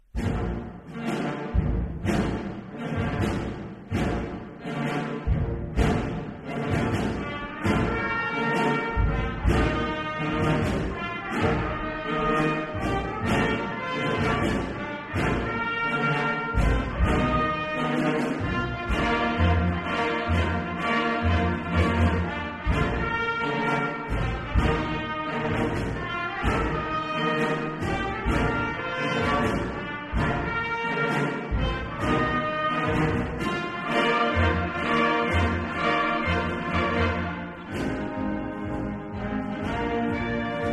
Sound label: concert band; St Botolphs